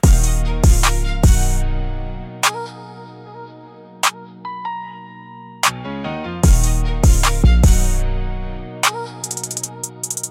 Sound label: Hip Hop Underground Rap